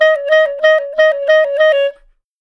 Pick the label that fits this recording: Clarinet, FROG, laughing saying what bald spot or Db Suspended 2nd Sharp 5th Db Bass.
Clarinet